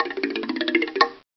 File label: Afro Riff; Cheesy Lo-Fi Sound Effects